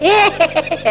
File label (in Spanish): risa